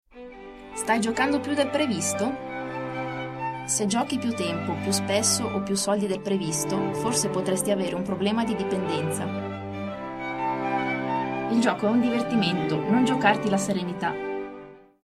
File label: Classical